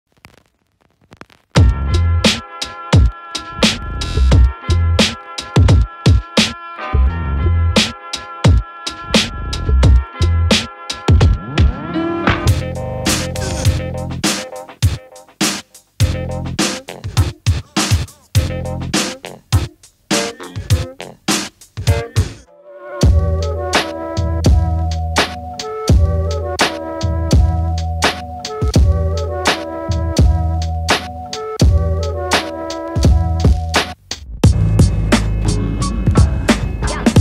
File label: GRIT DRUM, Lo-Fi Boom Bap Drum Kits; BEEFY GRIT DRUM STASH VOL. 2